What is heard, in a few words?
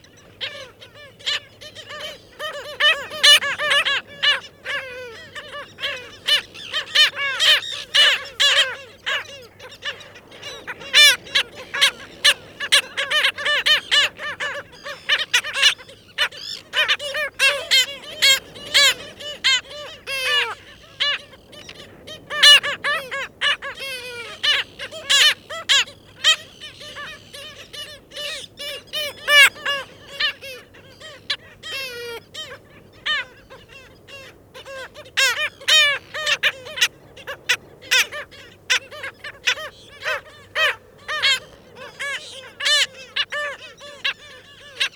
Bird Call Black Skimmer Colony